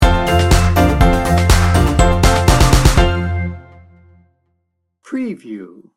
joy to the world sting bumper

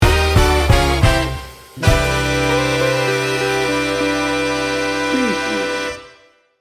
big band swing sting bumper

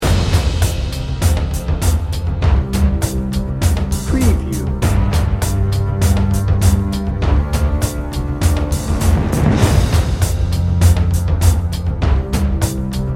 adventure quest dnb

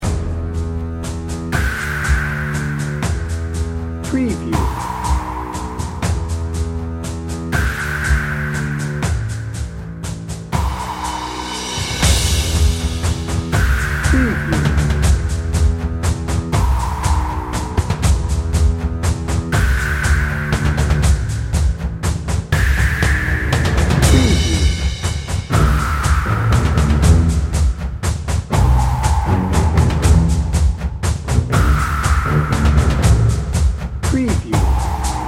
quest for glory dnb

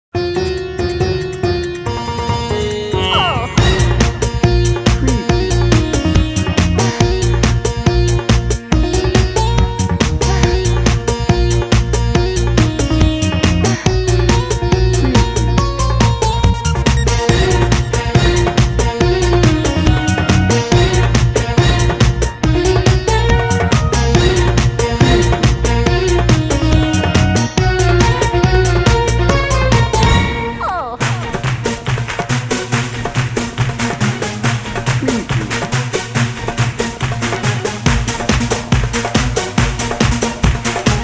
bollywood bash

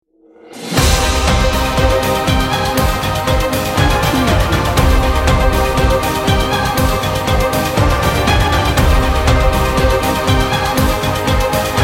warrior march

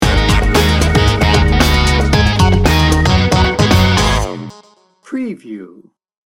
bright and bouncy sting bumper

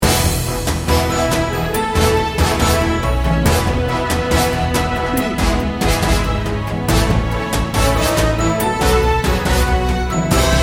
triumphant heroes

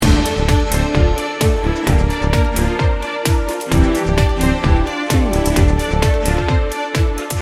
heroic power loop

